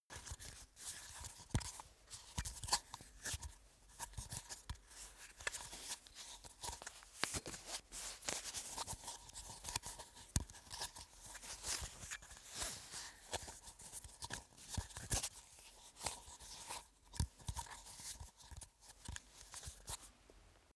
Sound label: makeup box stroke handling; haus-garten; badezimmer